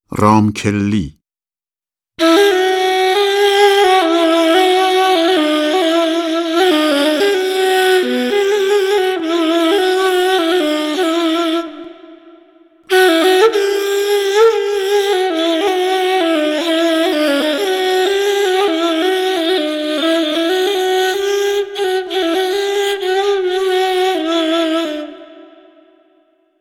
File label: Segah